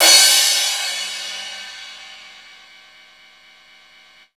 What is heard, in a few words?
CYMB; Houseworx; Drums; Cymbal